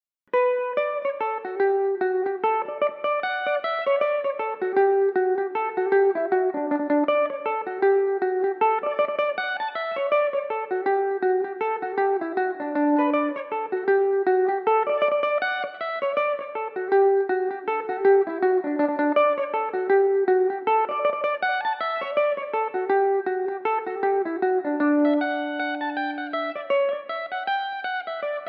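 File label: D major belmuse, irish mandolin; The Willow Tree; (4/4); Belmuse EM-190 electric mandolin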